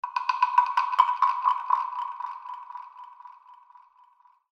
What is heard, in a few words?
wood